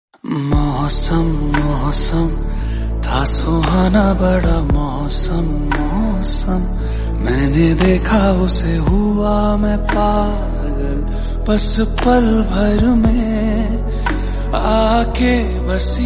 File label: hindi song